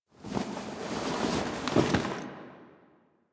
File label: Foley Ship Move One; Drifter